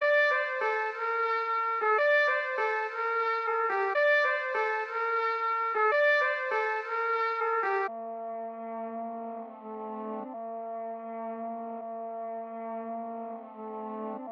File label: 122 BPM G Min Trumpet; Melody; Trap; Loop Stems; Cymatics; 2022 Melody Collection - Trap Pt 2; Late At Night